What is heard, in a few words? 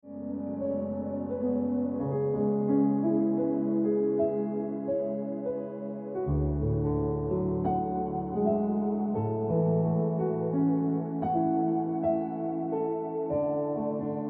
Piano Music